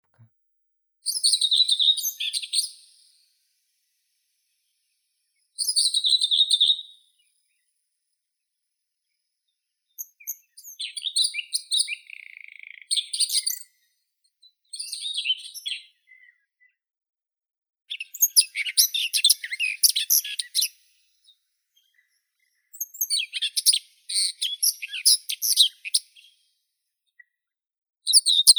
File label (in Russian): slavka seraya sylvia communis, zvuki prirodi